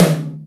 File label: Night H, Acoustic Tom; Yamaha DTXpress